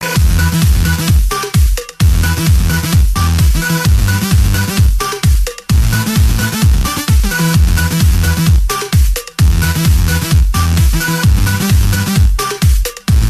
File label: Bass Cool